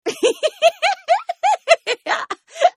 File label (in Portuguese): risada debochada